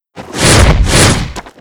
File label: Snowy Puggy Skill; Seer; GamigoNA